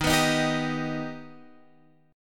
Eb Augmented Eb, Guitar Open F; Eb+ strummed; x,10,11,10,10,10; JGuitar

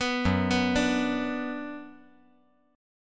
F sharp Suspended 4th Sharp 5th; arpeggiated; JGuitar

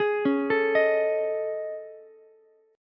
A Major Sharp 11th, Ukulele, C6; AM#11; arpeggiated; JGuitar

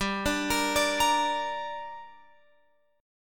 G Minor G down Guitar Open F, chord; Gm arpeggiated

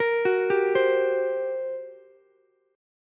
G Minor Major 7th Double Flat 5th, G, Ukulele; arpeggiated; JGuitar

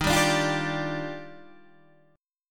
Eb Major 9th, Guitar DADGAD

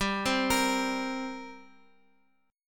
G Minor Double Flat 5th, G, Guitar, Open F; arpeggiated; JGuitar

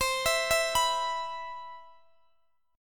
C Major 7th, C, down, Guitar, Open D

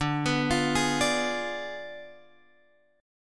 D Diminished 7th D, Guitar, Open F; Ddim7 arpeggiated; x,9,11,12,11,9

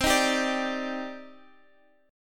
Eb Major 7th C; Ebmaj7/C; strummed

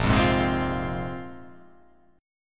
A sharp 7th Suspended 2nd down

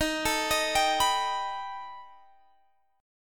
B Augmented 7th Eb

